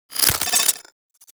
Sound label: Rifle Alt Mech, weapons; Gyre